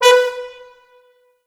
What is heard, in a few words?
B3 L, Orchestral Brass